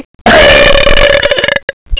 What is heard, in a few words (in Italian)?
Deciso, Rutti